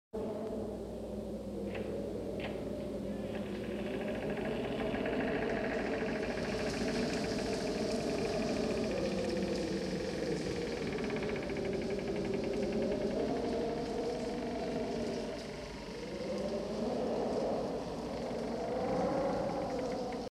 knarrende Tür öffnen; Horrorgeraeusche

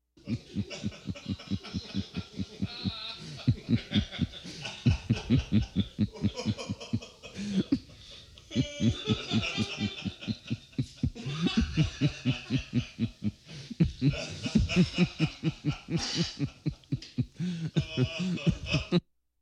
gelächter männer & frauen; Lachen

